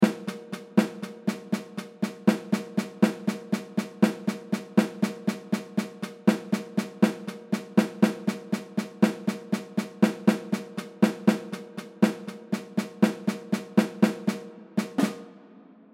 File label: add a pop slow